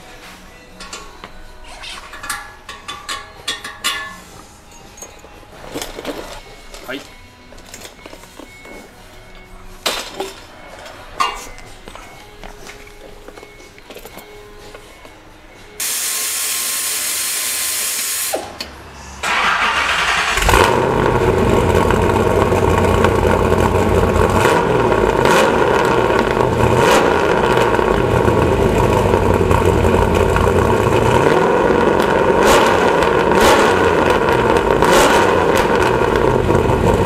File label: iroc borla, muffler